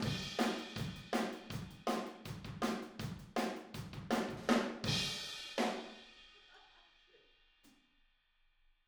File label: Outro Room ST, 162 BPM, Drums